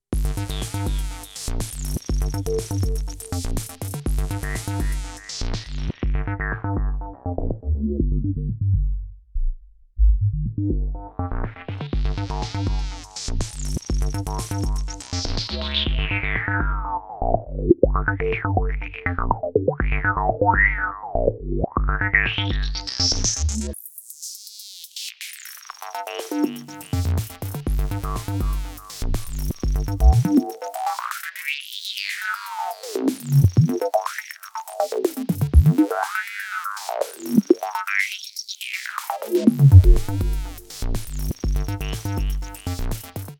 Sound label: Korg Electro Break; Korg SVF